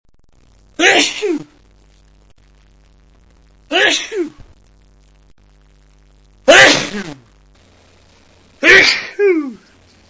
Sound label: cold, male